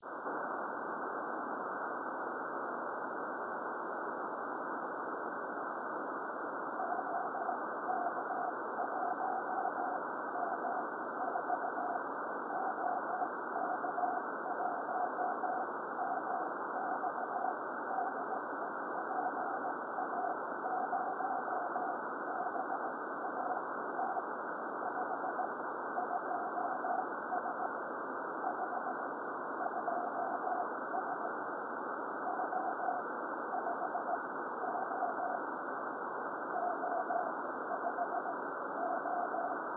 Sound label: CW; PA0BAT; 3cm